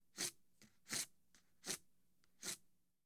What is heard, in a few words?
Cards Slide From Shoe